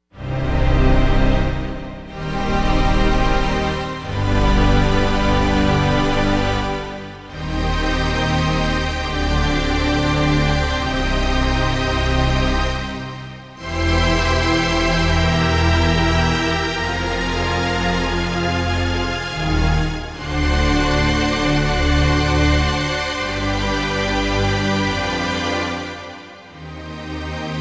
Big Strings

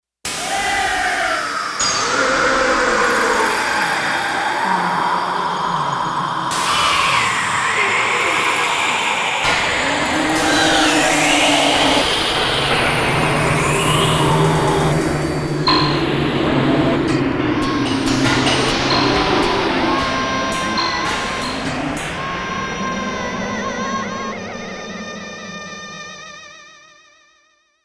FX Menu

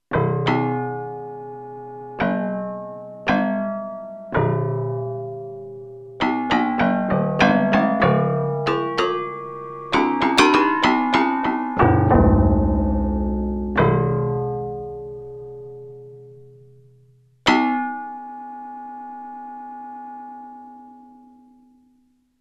Piano Harmonics

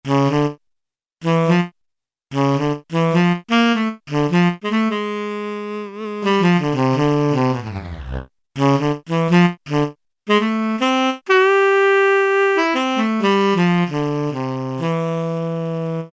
SAX Tenor Breath; Brass And Woodwinds; Roland L-CDX-03

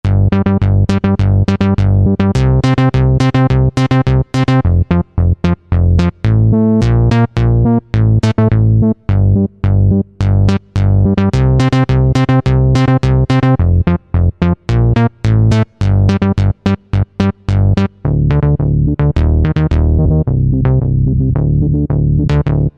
Euro 8va Bass, Korg TR-Rack

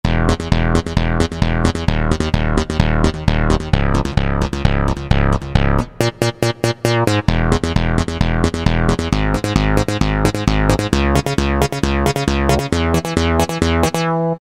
SYN.BASS, Roland SN-U110-09 Guitar And Keyboards

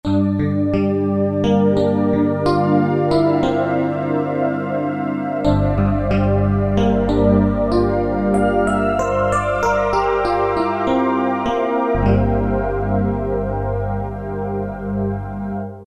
Air Piano; Roland PN-D50-04

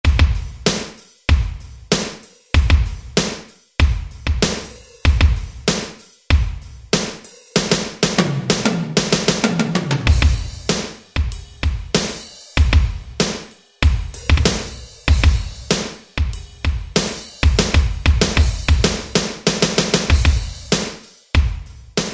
Rock, Alesis SR-16

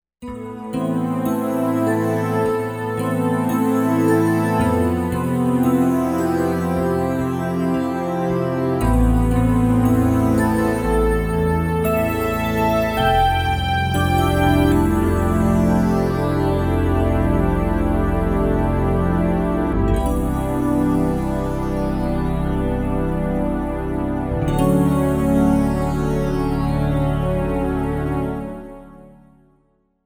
Bell Blue

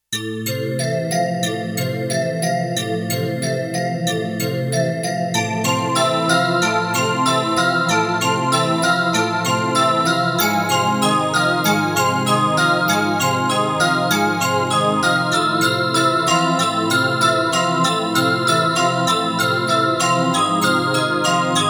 Bell & Fantasia, Roland JX-1; Factory presets; Dual patches

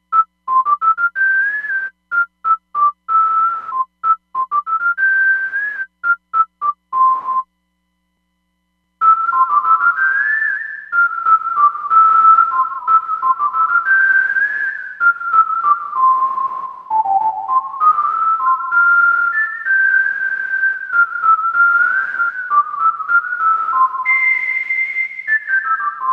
Modulated Phased room

